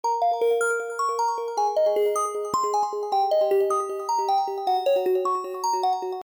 Bells

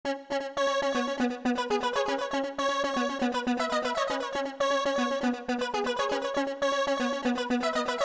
Lead

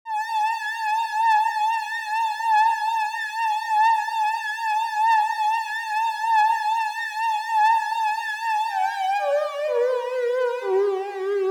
Lead; PatchFactory

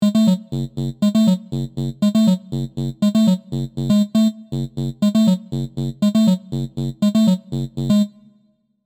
Lead; PatchFactory

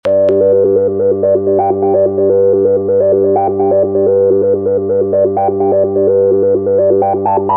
Lead

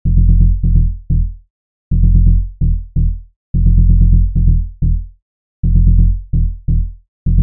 Bass; PatchFactory

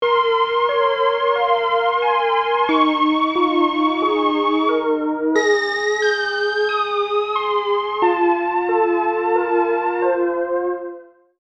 Keys; Kill Bill

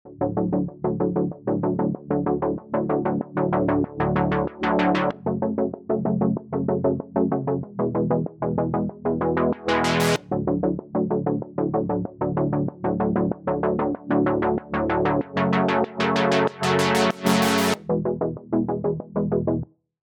Chords; PatchFactory

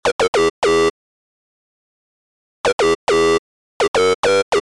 Lead

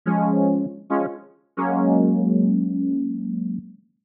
Chords

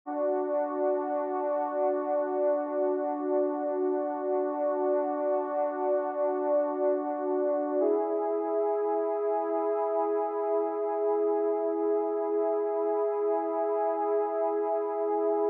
Pad; Seren

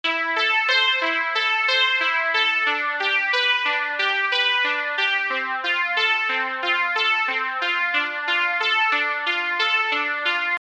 Lead; PatchFactory

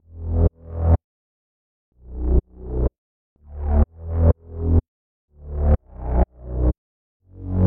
Bass; PatchFactory

